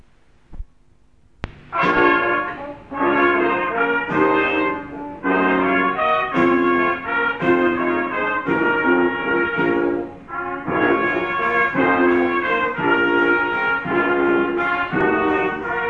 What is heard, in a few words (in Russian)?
Трубы; МО в г.Белая церковь